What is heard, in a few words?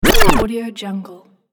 vinyl rewind